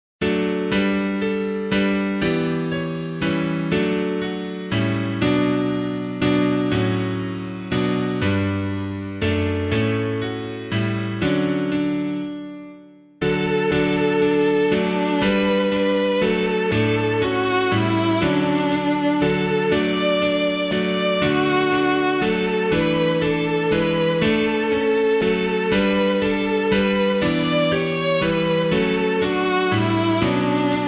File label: piano; A Mighty Wind Invades the World; Stanbrook Abbey; HERONGATE